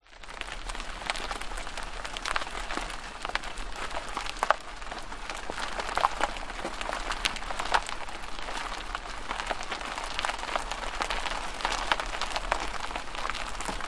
vehicle car suv roll engine off slow gravel road track close to tyres